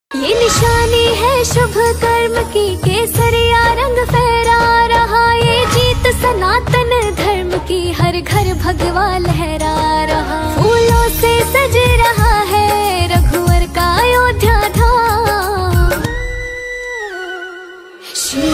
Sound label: haryanvi ringtone